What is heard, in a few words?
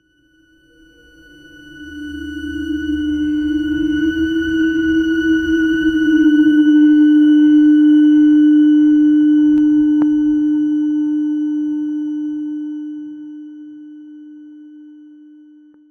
layer with subtle breathy, breathy